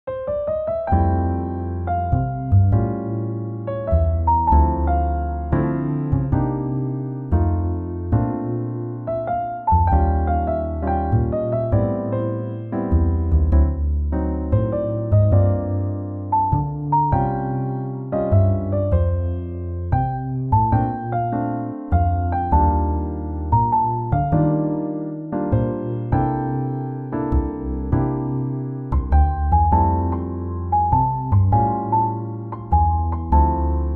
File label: Swingjazz; 잔잔